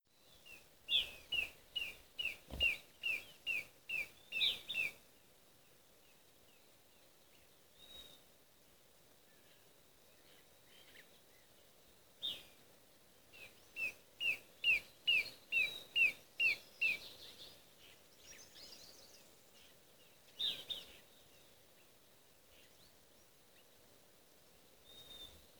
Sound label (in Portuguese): formigueiro do nordeste Formicivora iheringi